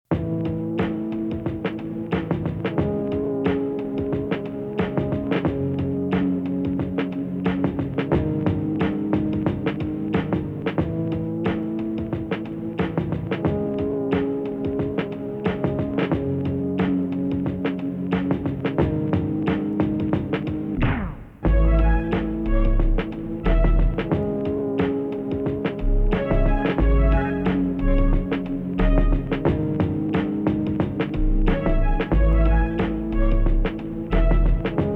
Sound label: OP1 TYPE BEAT